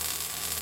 Neon Loop

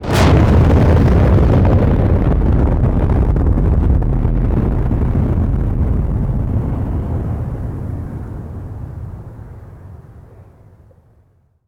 NUCLEAR Burning Air Long Echo Tail stereo

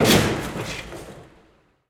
Pilon High Impact

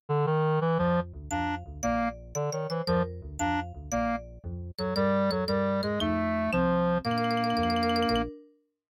MIDI Animation stings

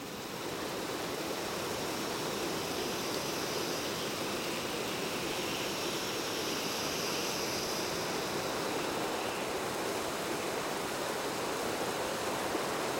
ash background ambience; Aeon Must Die

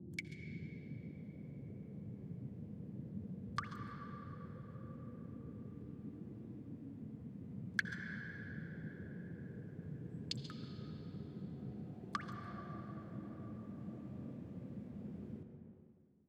Ambience Mansion Dungeon 36beats 140bpm

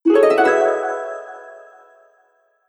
Stinger New Story Unlocked; Sea of Stars Original Soundtrack

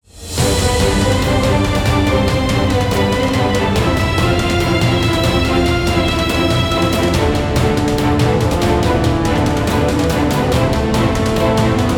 Music Victory; Hanako: Honor & Blade

